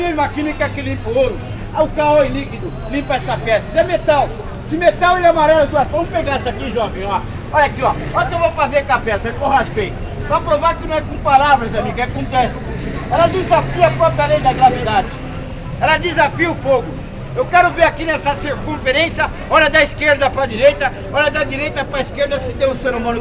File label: Praca Da Se, Brasilien; Fieldrecordings; Corroi O Acido